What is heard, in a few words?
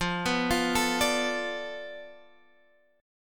F 6th Flat 5th F down Guitar DADGAD, chord